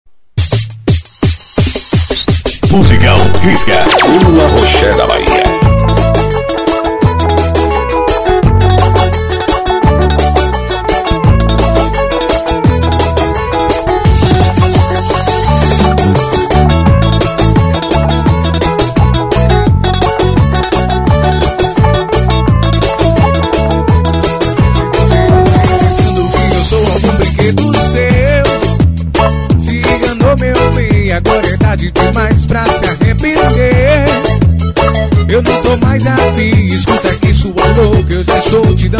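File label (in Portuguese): tecno brega ao vivo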